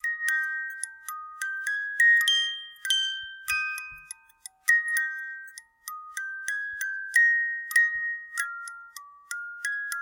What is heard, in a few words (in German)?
Spieluhr